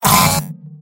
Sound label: engineer pain sharp; tf2; mvm